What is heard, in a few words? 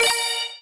Block Combo; Ui Block Combo3